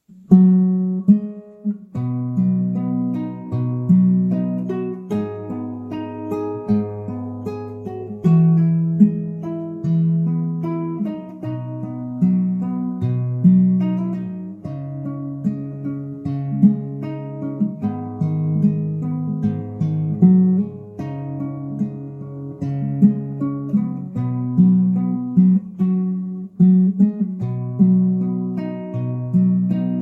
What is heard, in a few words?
fingerstyle